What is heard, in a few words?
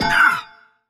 pain, robot; Learn to Code From Zero With Godot